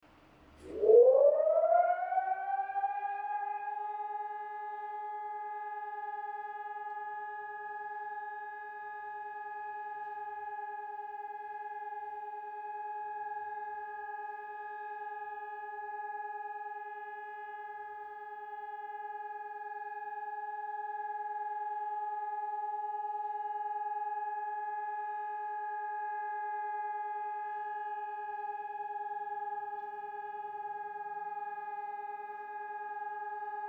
air raid siren, The air raid siren in Prague that sounds the first Wednesday of ev